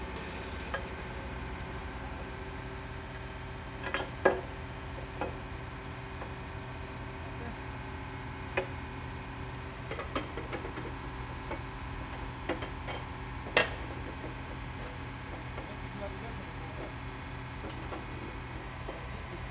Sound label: Ambience Construction Site; Just Ambience; soundbits